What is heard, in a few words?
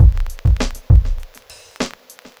drloop, drumloops, Vinyl Attack; Masterbits